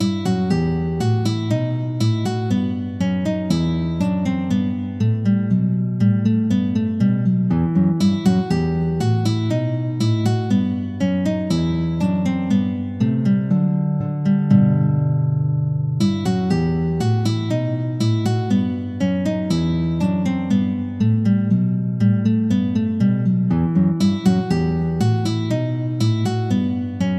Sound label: bouree minor; bach johann sebastian; bwv 996